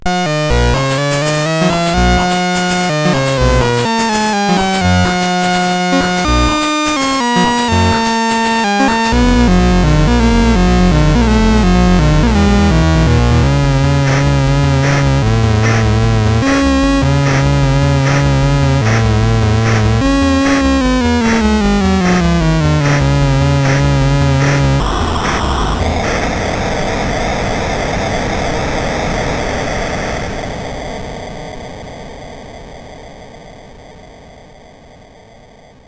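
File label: Reactor Run, MOS 8580 SID chip music, games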